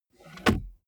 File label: car bmw e46 interior center console close slide